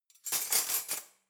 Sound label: cutlery knives drawer place